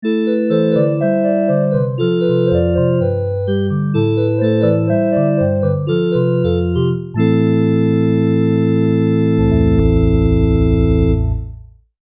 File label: Small Pipes, Prophet '08